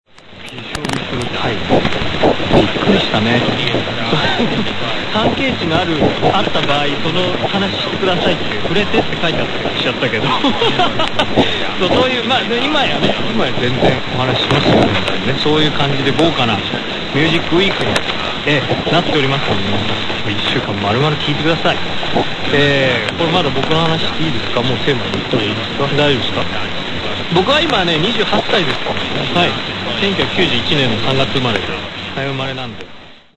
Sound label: 1440 JOWF Sapporo J; sdr Amberg